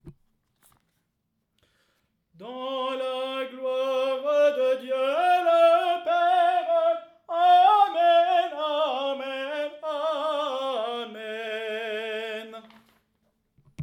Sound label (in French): Tenor